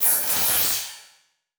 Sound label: Transition Electrical Surge